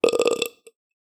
burp

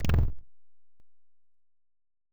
come out door open; player

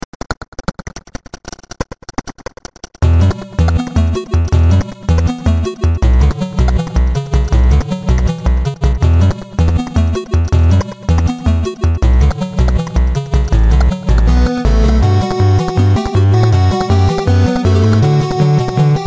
alt stage, platformer chiptunes

